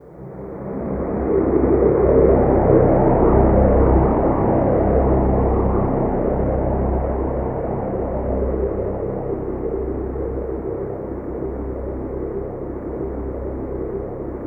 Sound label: ATMO, Special FX Twisted; USB Soundscan; AKAI